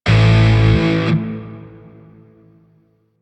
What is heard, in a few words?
Shawzin Chromatic Chord J; Grineer; DE; Warframe